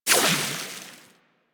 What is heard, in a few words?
Water Sprayer Beam Stop; Warframe